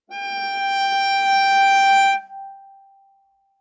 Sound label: Viola arco ff sul D G5 stereo; MIS Pitches - 2014; Strings